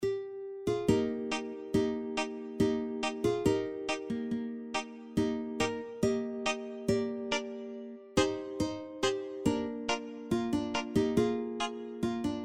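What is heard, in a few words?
Rhythm Guitar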